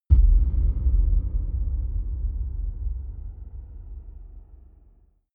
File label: dark outer space shadow, hits impacts